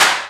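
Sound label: clap heavy, drum machines; 300 Drum Machines; E-MU Orbit-3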